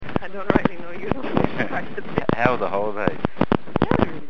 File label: Crackling